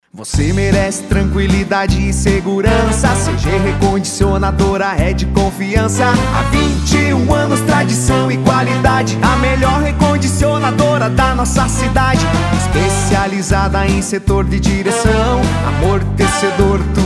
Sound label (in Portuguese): Jingle Comercial; CG Recondicionadora